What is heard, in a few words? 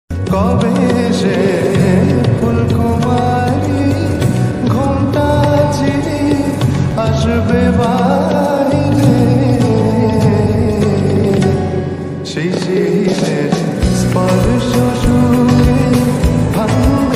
ringtone new trending ringtone bengali ringtone music ringtone bengali